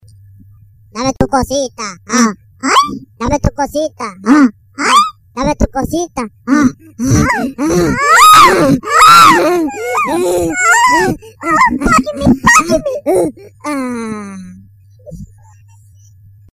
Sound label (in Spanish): gracioso tono de llamada